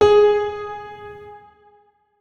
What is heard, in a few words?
notes, keyboard piano; Hideout Furniture